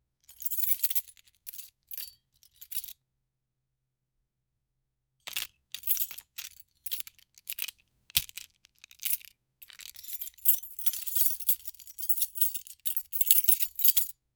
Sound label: collect wooden key effect